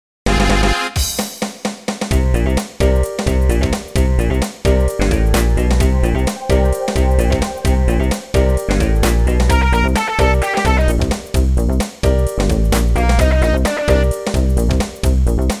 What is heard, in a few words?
bass